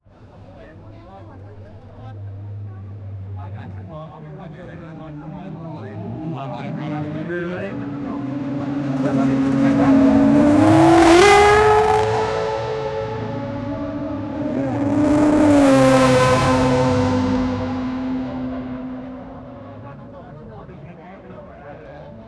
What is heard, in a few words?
continuous, motorsport